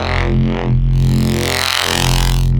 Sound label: Reese Bass F; Antidote Advent